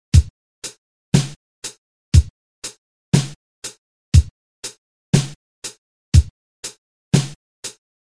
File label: straight 8 beat